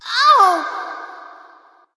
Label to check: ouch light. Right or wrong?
Right